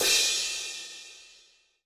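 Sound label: Splash Basic, splash cymbal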